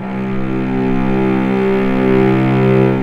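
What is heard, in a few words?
C2, strings; East West; The Ultimate String Collection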